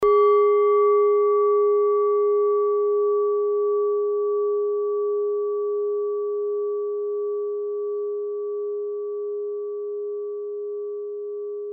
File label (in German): klangschale nepal, Klangschalen Nepal